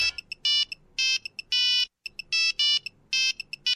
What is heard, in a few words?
nokia 3210 kick